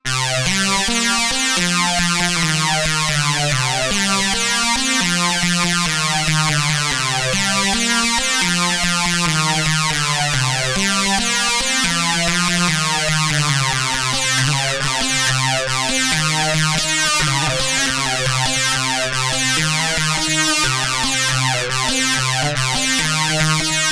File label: BOSS VF-1 SYNTH, bass